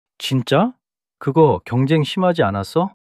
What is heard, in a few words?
surprise